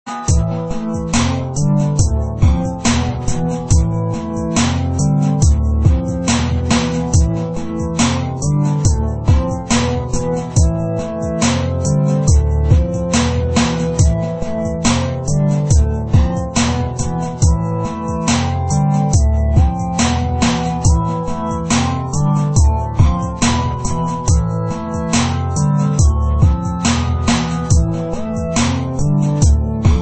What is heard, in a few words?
Korg DS-10 Mix